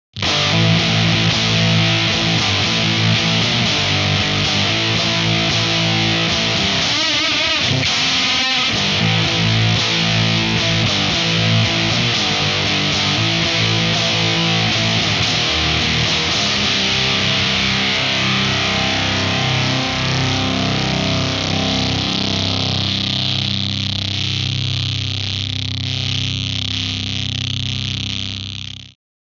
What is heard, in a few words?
GTR GAIN 75 LO BLAST 20 HI BLAST 100 SCOOP ON